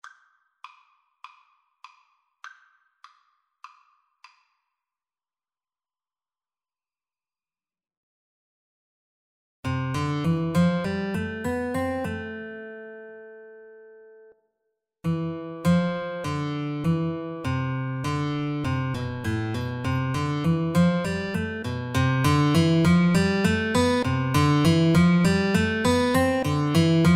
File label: Guitar Duet